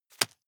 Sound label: juggle catch hard